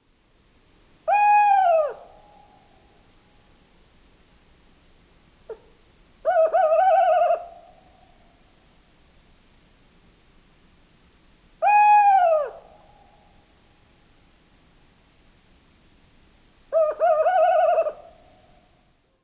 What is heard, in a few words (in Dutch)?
Bosuil